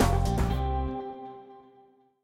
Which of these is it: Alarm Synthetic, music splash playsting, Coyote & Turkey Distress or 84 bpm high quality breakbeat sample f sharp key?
music splash playsting